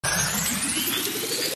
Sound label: Cannon ready